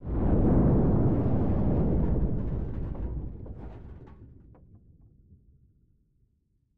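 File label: muffled distant sound of explosion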